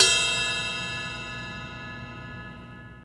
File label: drum ride sound e key, Royality free ride cymbal tuned to the E note. Loudest frequency: 3868Hz